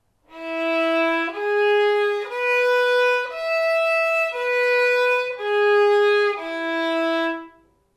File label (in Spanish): mi arpegio; mi primer libro de escalas